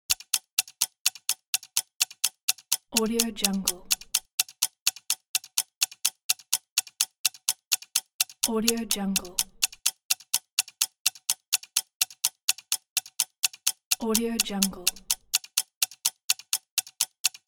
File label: timer; pure-noise